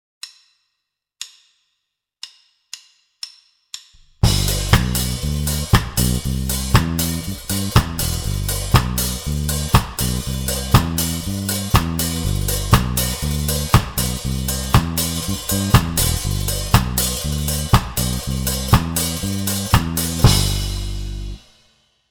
SKA, Batterie Reggae; Buchmann; Batterie Reggae VIbrations

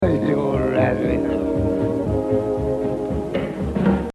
Exercise, jazz drumming

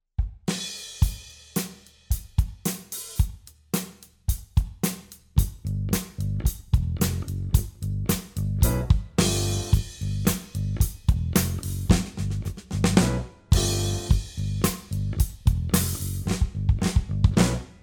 Pop, Drum Fills; Essential Drum Fills; Erskine

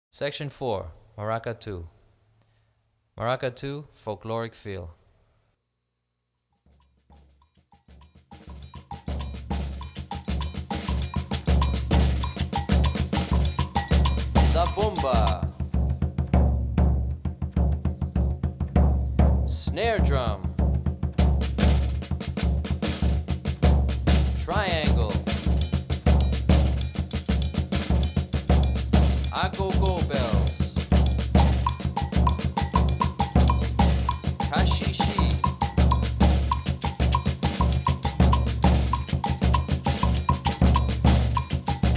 Folkloric Feel, Brazilian Rhythm For Drumset; DaFonseca, Weiner

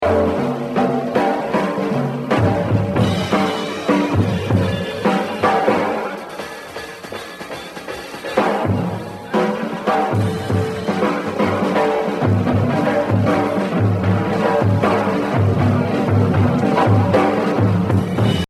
Exercise, jazz drumming; Kenny Clarke; The Evolution of Jazz Drumming (Gottlieb)

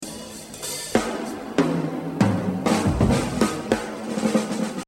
Jazz Drumming; Buddy Rich; The Evolution of Jazz Drumming (Gottlieb)

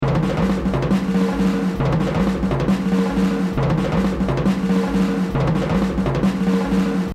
Exercise, jazz drumming; Rufus Speedy; The Evolution of Jazz Drumming (Gottlieb)

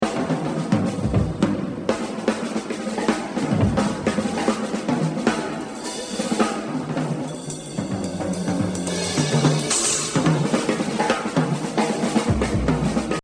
Jazz Drumming

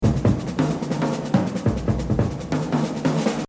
Exercise, jazz drumming; Buddy Rich; The Evolution of Jazz Drumming (Gottlieb)

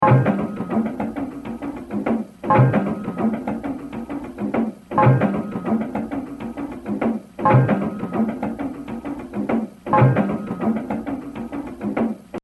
Exercise 1, Jazz Drumming - 78%; Baby Dodds; The Evolution of Jazz Drumming (Gottlieb)

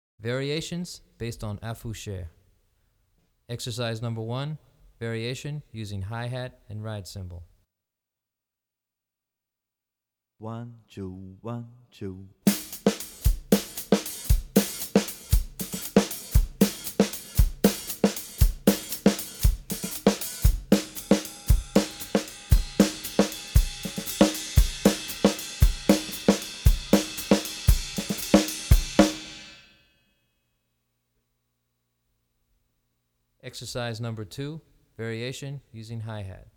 Afoxe, Brazilian Rhythm For Drumset; DaFonseca, Weiner

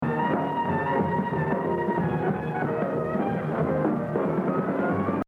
Jazz Drumming; Exercise 13 Gene Krupa - 100; The Evolution of Jazz Drumming (Gottlieb)

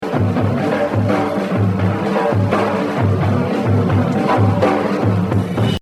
Exercise, jazz drumming; Kenny Clarke; The Evolution of Jazz Drumming (Gottlieb)

